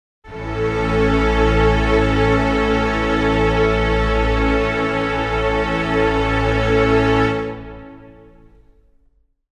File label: string chord a major, Pads